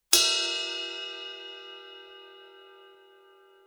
Sabian 21 HH raw bell ride bell

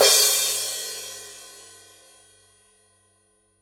Paiste PST3 16 crash edge

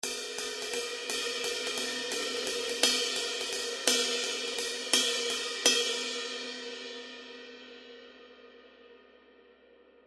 Sabian 21 Vault Crossover Ride

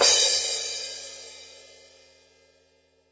Paiste PST7 16 thin crash edge

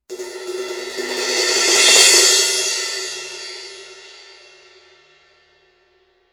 Sabian 15 AAX Dark Crash

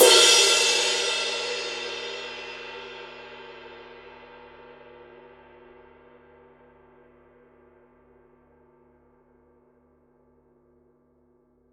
Paiste signature 22 blue bell ride edge

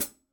Paiste 2002 14 heavy hi-hat chick

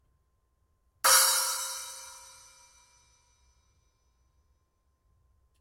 Zildjian; ZHT10CS

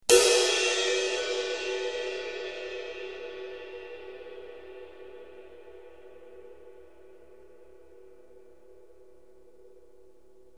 Sabian 20 HHX stage ride bow

